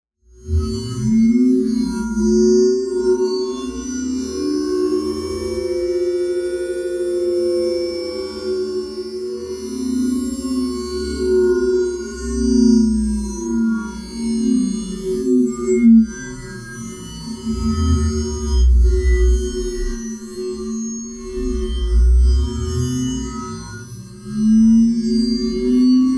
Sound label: bell